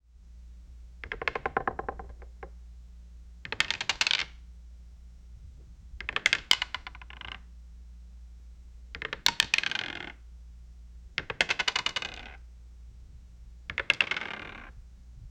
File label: pieces moving on a chess, board games